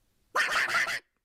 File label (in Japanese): 犬が吠える